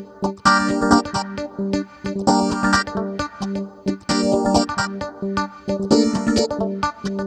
Special Sounds Root G 132 BPM; Vengeance Samples; VEH3 Special Sounds

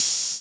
Cymbals Ride; Vengeance Samples

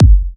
Bassdrums; Vengeance Samples

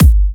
128BPM Heavy Duty Kick; VFH1 128BPM Heavy Duty Kit

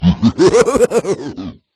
Vocal Oneshots; Vengeance Samples; Vocals

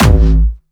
A1, Jumpstyle Kick; vengeance essential club sounds-3; VEC3 Bassdrums

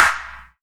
Claps

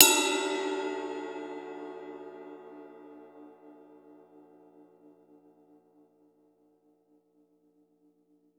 cym; house; PS-28 Vocal House Samples; cymbals; Drum & Perc

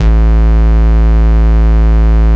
Bass Root A

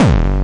BD Distortion, Bassdrums; Vengeance Samples

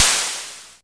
Open Hihats, Cymbals; Vengeance Samples

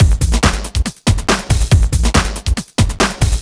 Breakbeat; Vengeance Samples; VEC2 Loops

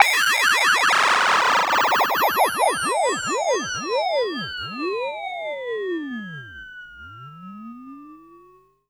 Downlifter

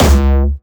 Jumpstyle Kick C#2, vengeance essential bassdrums; Jumpstyle Multis; vengeance essential club sounds-3; VEC3 Bassdrums

